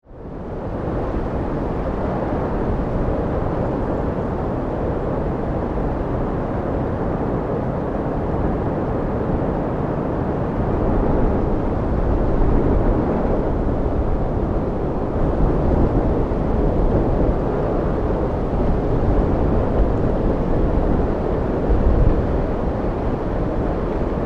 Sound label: Wind Storm, Weather; Wind (Sound Effects:Weather); BlastWave